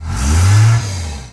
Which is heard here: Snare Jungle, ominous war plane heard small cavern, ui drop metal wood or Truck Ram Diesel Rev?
Truck Ram Diesel Rev